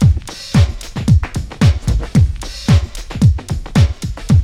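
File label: drum loop; X-Static Goldmine 3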